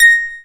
A#, Synclav FM PERCUSSION; Big Fish Audio

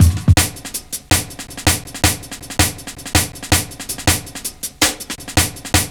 Break 162, Drum Loops, Jungle; Jungle Warfare 1&2

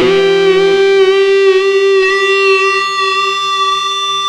lead (bend n squeel); Sonic Foundry (Sony Creative Software); Ugly Remnants VOL-2; Leads

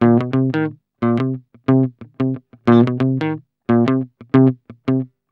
GUI 90 LICKS

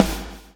BLOW; Spectrasonics - Backbeat

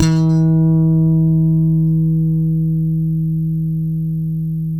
FUNK, Bass; Spectrasonics; Bass Legends

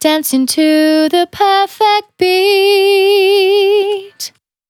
Finally 70bpm C, Velvet Vocal; Zero-G

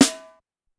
Snare, Clavia DDrum-AT, Pitched Up

